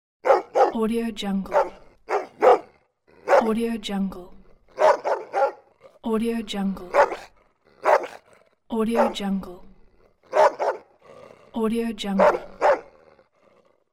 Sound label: dog barking